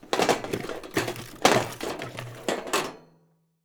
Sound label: coal, grilling; CosmicRage